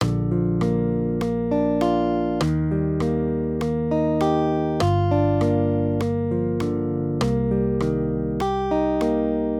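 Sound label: Guitar, Accompaniment, Major Triads, Bb-Eb, 100 BPM; maj5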